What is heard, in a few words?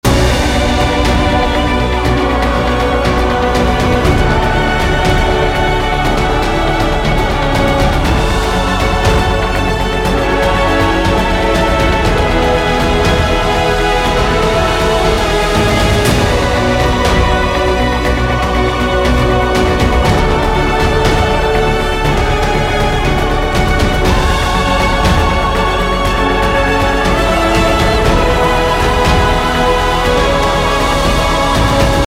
Loop Action